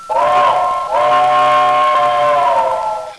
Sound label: whistle